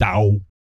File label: BASS FX, Vocal Planet, Jazz FX; Spectrasonics